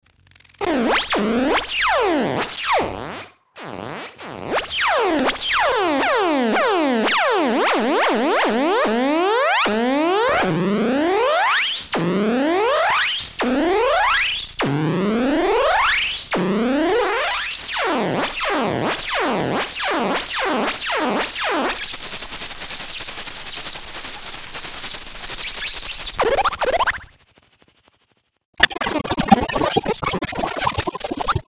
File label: woooping; ciat-lonbarde; fyrall